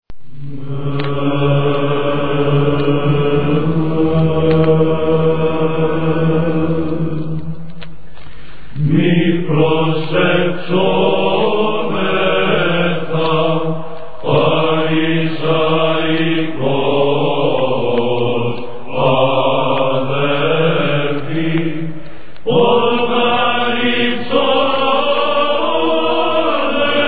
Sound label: Mi Proseuxometha, Choir